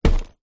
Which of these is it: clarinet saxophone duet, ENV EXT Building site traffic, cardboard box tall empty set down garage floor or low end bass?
cardboard box tall empty set down garage floor